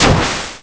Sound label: Fish Appear; The giant fish appearing; zelda